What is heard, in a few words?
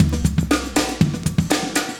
chique 120, loops, 120bpm; Sound Effect Library